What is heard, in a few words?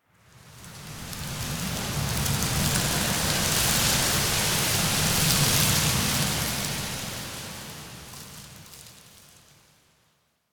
Tower Wind Texture; Warframe; Quests; TheNewWar; Ambience; Cetus; Unum Tower